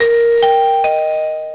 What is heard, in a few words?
sound completed